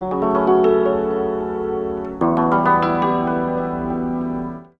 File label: ZIMB, Gipsy; Best Service; Ethnic Journey Sound Cube